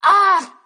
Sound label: "ah", Voice; ooh ahh